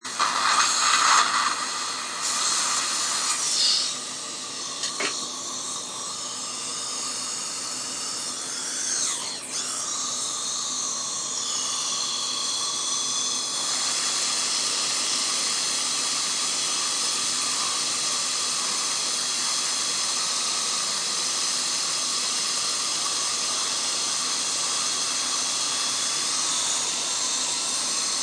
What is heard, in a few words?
Dentst Drll Cavty, Dentist drilling cavity.; Dentist Drills Cavity; Drill Sound Effects; Sound Effects:Tools